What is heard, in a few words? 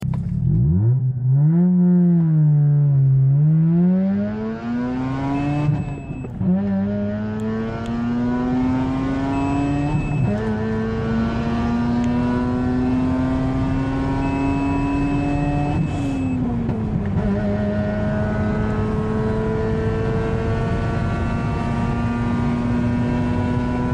Mazda Wankel Sound